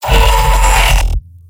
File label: m pain severe; pyro; tf2; garrysmod; mvm